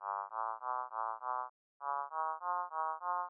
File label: pitch pitch same